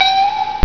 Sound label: ping; sunrisechat